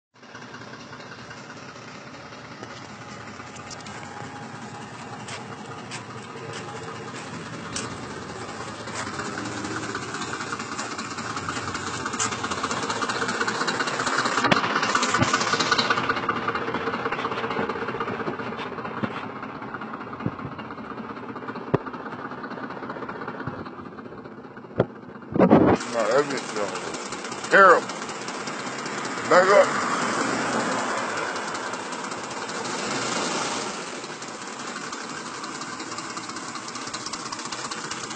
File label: 5.4l oil pressure problems; 07 king ranch